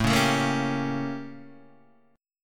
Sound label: A 7th Flat 9th Guitar Open D